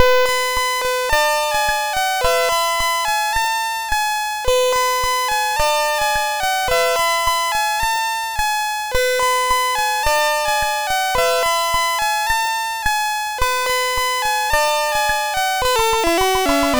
Countdown, MOS6581R3

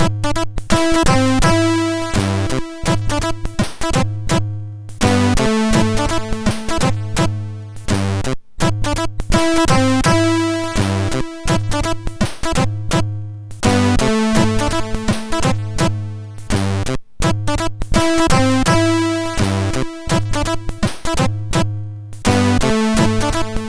sid, MOS6581R3; Usa4ktro

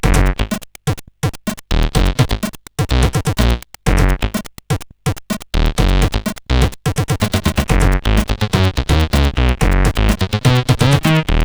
Slapbas 2voice, sid CSG8580R5

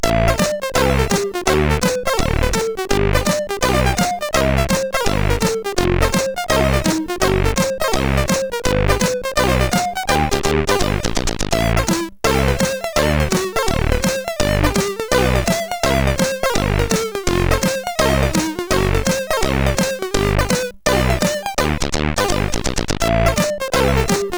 Jazz, sid CSG8580R5

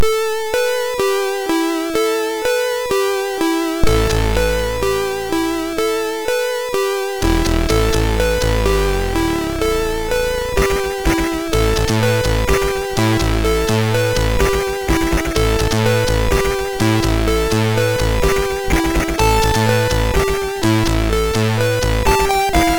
Jumping Jam, MOS6581R3; HVSC

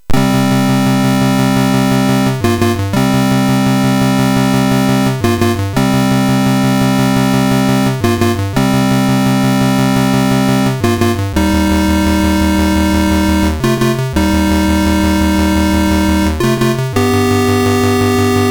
Slap Fight, MOS6581R3; hvsc

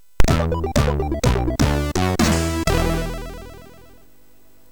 Two Jingles, MOS6581; hvsc

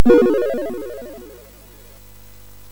SFX, MOS6581; Narco Police; MOS6581R4; hvsc

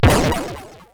SFX; Narco Police

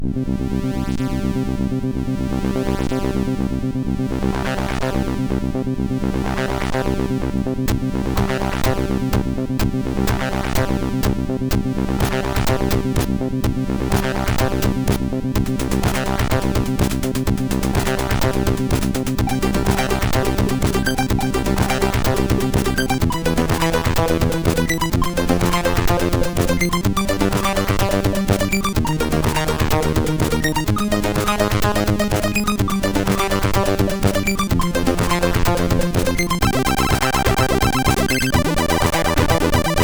sid MOS6581R4; Wild Style; hvsc

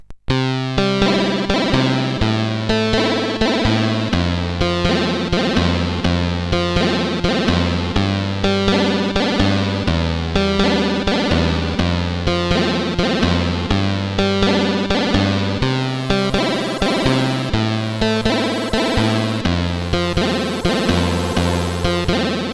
Guitar by the Fire, sid CSG8580R5

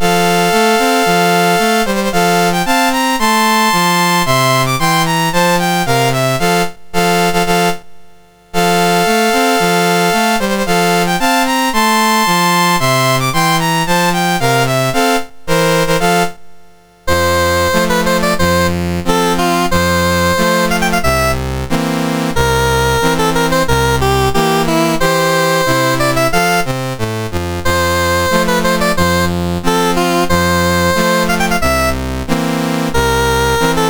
Saudi Arabia, National Anthems, MOS6581R2; Dave Marquis

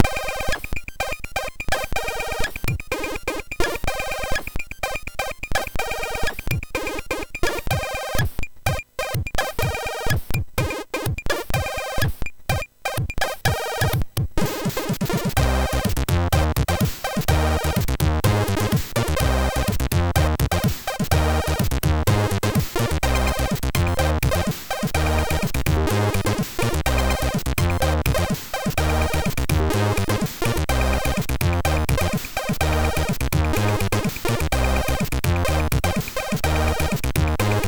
Oriental

